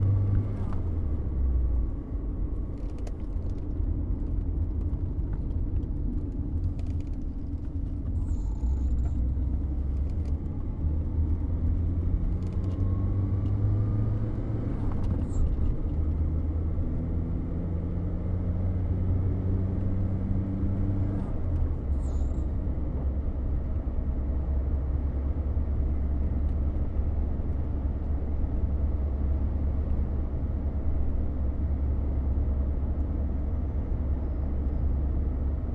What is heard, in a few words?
4 banger Driving